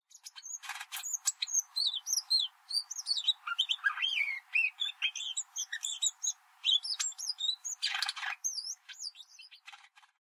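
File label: Daurian Redstart; jobitaki; syobyou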